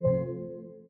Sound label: begin; iOS Sounds